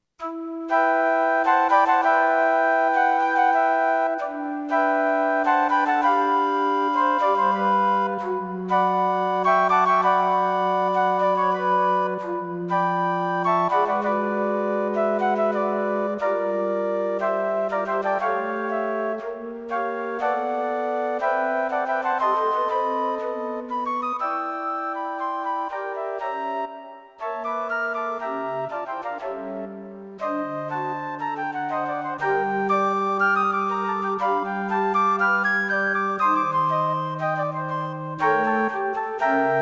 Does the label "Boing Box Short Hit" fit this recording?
No